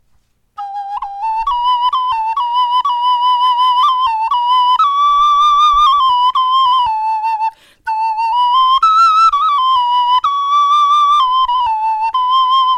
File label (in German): melodie